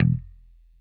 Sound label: 6STR BASS, E; Sampleheads; Whole Lotta Country 1 & 2